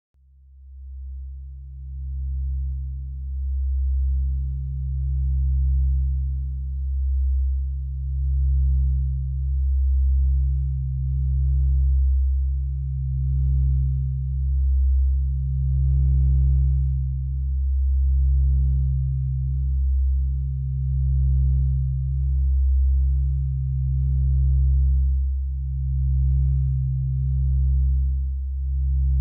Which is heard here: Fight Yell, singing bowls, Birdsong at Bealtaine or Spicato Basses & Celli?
singing bowls